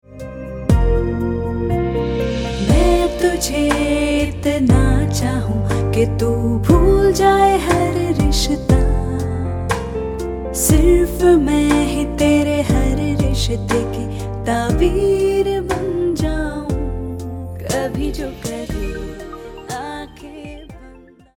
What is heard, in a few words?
love day song Hindi female